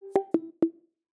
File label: In Call Quality Notification; Telecomm